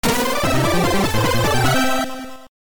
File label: jingle; Mousehunt